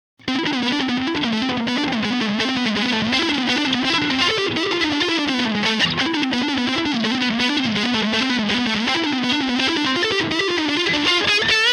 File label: walking